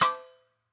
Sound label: mine metal